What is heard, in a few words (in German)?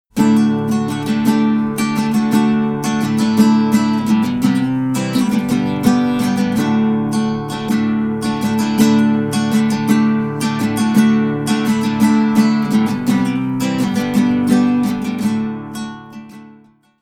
Nylongitarre mit Neumann TLM 103